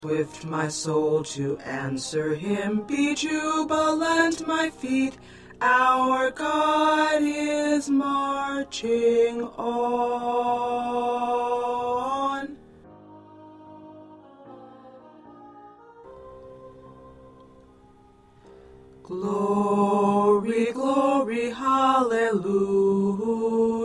Tenor

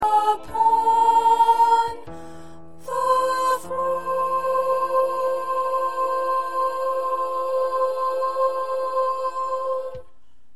1st Soprano

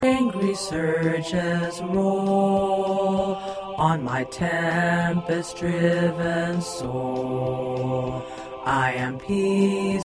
Bass

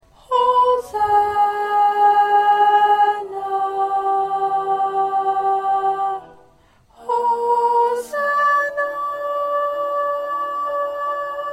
1st Alto

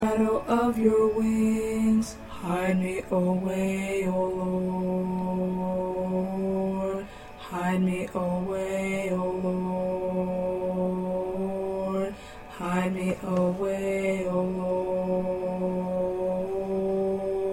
2nd Tenor

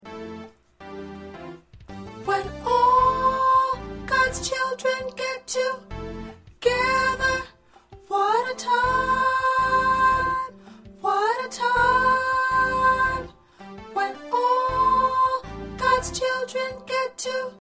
Soprano

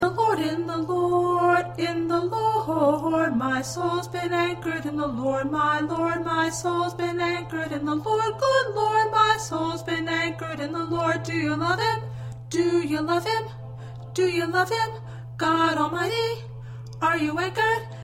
1st Alto

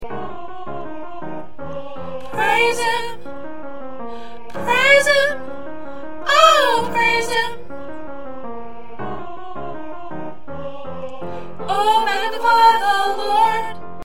1st Soprano

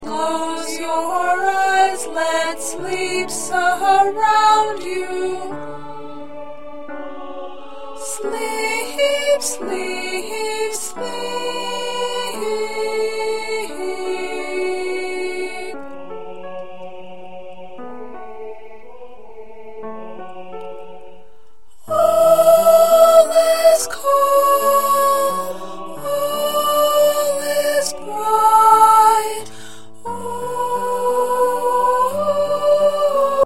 Soprano, choir parts

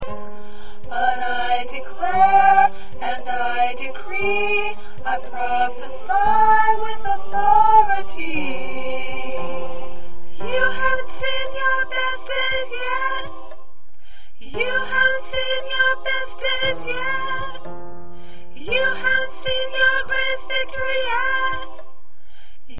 Soprano

